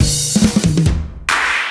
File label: Fills, Loops 140 BPM